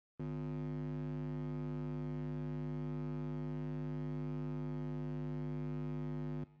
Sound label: Transformer supply